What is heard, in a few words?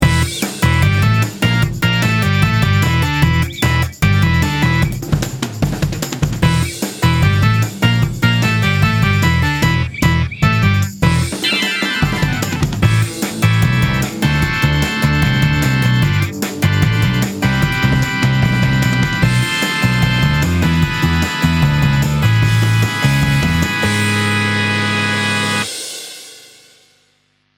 Farfisa rock